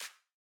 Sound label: One Shot Clap